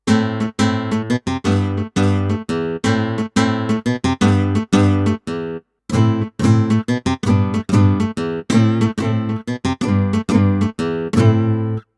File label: flamenco guitar strum mode; Roland Integra7